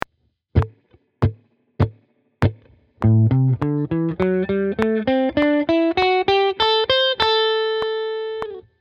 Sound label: blues, guitar